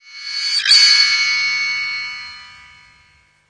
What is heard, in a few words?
backwards ringer, Guitars